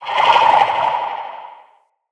Corrupted Slug Vocal Attack

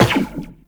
Tenno Run Zipline, Footsteps; Warframe

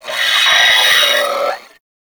Sentient Brachiolyst Death; Warframe

